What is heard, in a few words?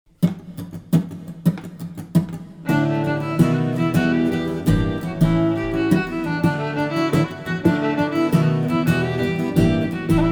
Wedding Racenica melodi och komp